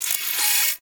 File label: Railjack Turret Harness Movement One Off H; Warframe; Vehicles